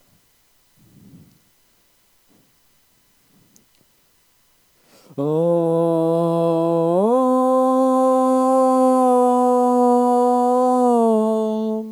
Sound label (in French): Choeur